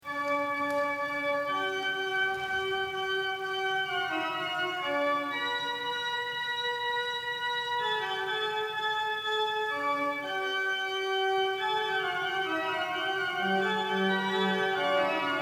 Organ, fugue

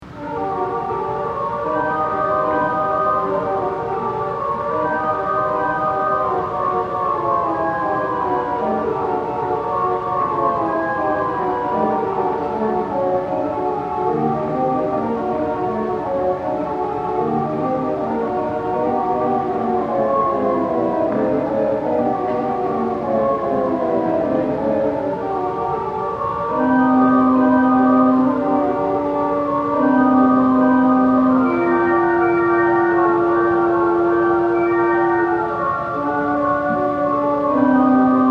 Organ Master Works prelude; dupre

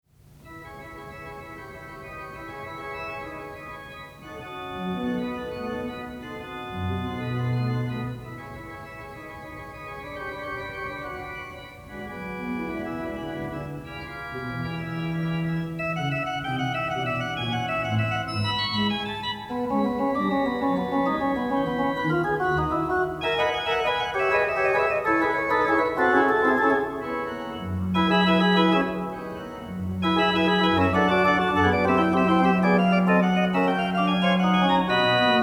French Organ Music; vierne

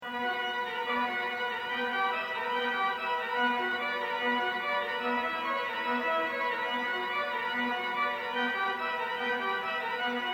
Organ Master Works; gigout

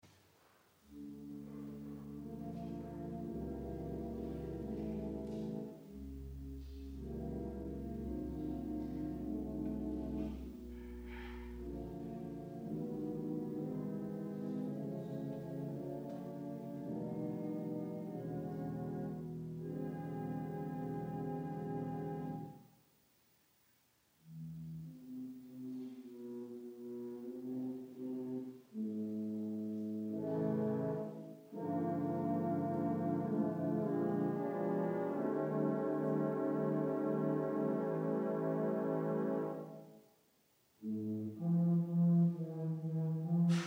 Organ Master Works; sowande

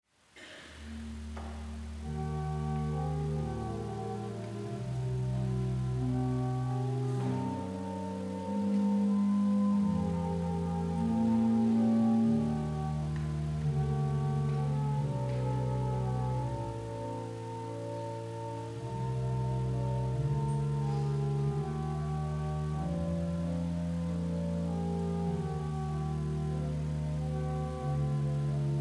Organ Master Works; bachschucke

